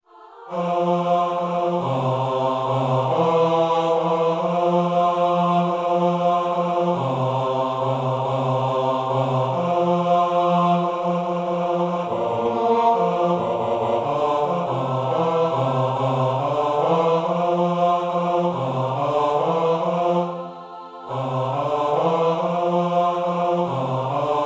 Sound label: Bass